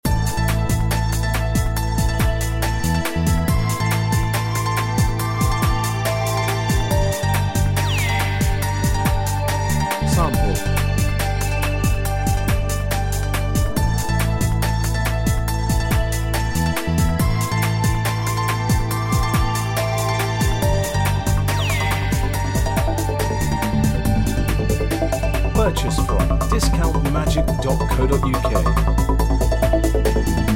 intense

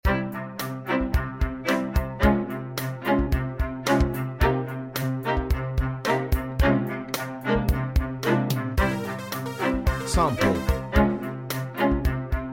beat

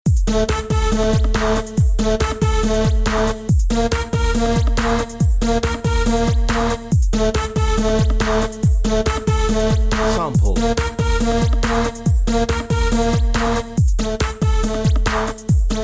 techno, backing

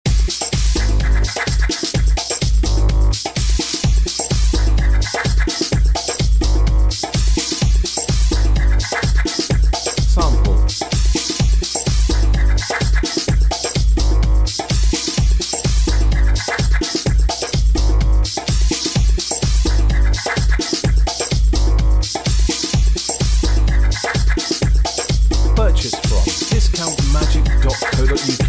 electro, backing

